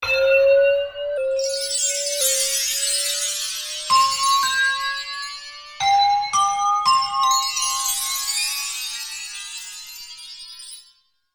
Delay Bend-Up, Wind Chime & Handbell

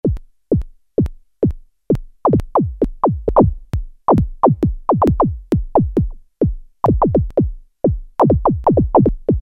Resonance Funk, Roland Juno-106; Factory Preset

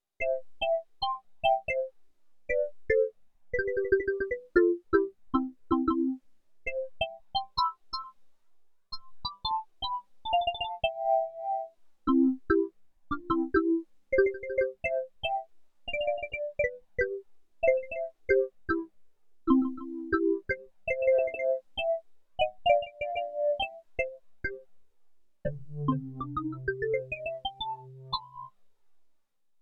MARIMBA, SIEL DK 80; Factory

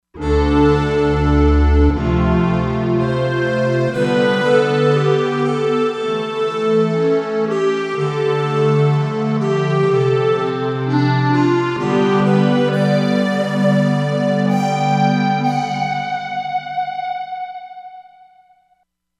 Synth Ens, Korg MSC-07S Synth; Korg MSC-07S Synth 2

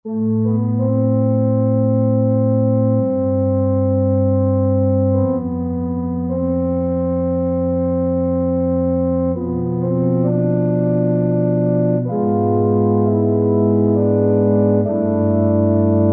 Harmonium, Roland SR-JV80 Orchestral

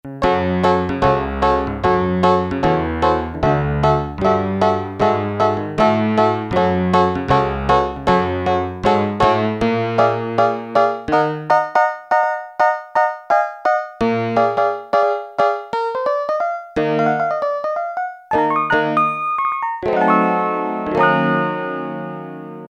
PIANO 3, Yamaha DX7

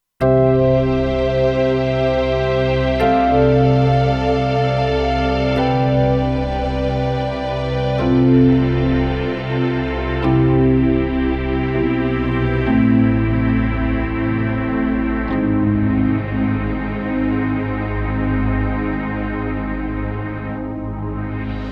Kurzweil K2500X; Factory Setups

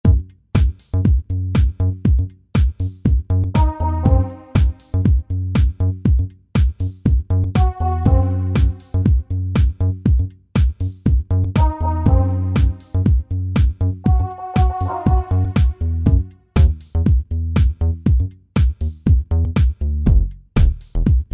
Groovy Dub, synth

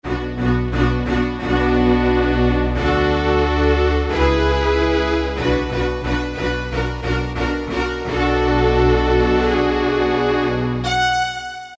Legato Strings, Roland D-50; Factory preset demos

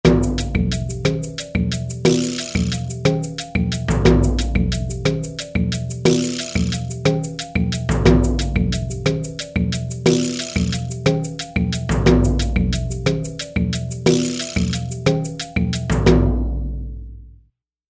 Polyrhythm

